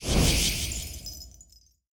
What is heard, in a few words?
win line flash; fairyred